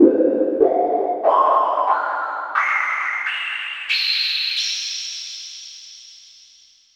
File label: Triangl